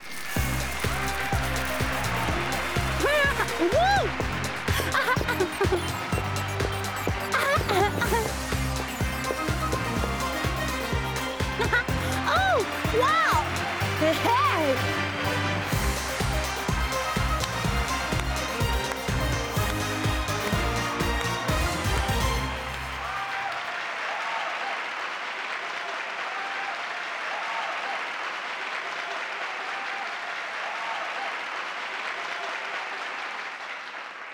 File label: End 80s Girl; buzz brain of the uk 2009 ps2